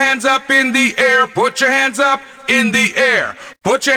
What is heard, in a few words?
Vox, Trance; Sonic Foundry (Sony Creative Software); Trance NRG VOL-1